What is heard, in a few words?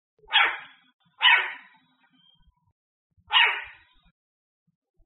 SEOW alarm call